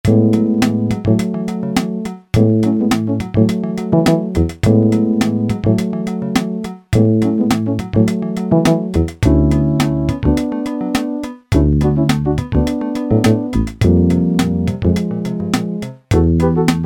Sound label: FUSION, Yamaha SHS-10; Factory style demos